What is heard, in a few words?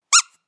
Squeaky dog toy